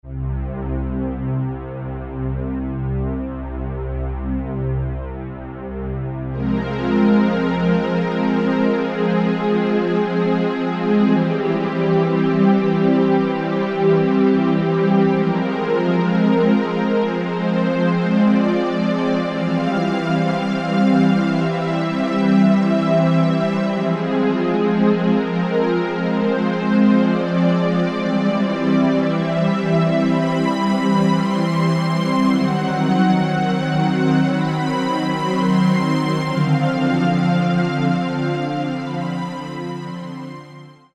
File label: St Ana Orch; Yamaha EX5R; Factory presets